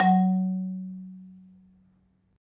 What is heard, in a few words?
m F#1, Marimba; Misc; Terkelsen